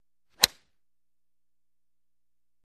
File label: Golf Tee Off